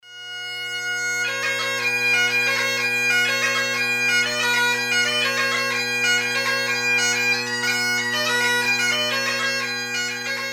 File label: Jig; Paddys Leather Breeches